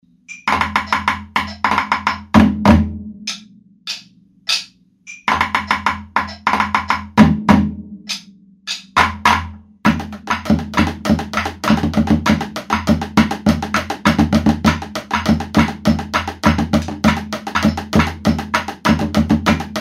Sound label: SAMBA REGGAE; CARAMURU